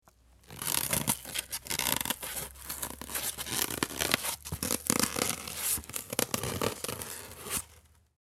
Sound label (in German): Styropor